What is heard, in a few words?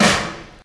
00s juicy steel snare drum g sharp key